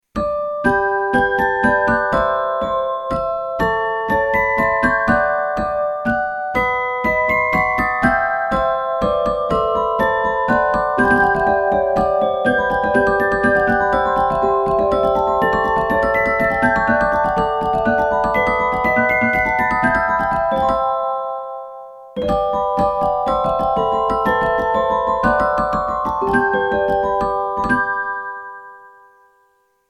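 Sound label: We wish you a merry christmas, music box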